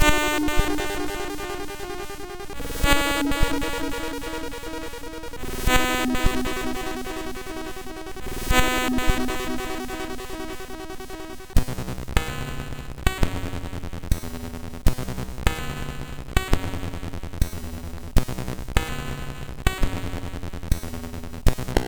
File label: sid, MOS6581R2; Good Night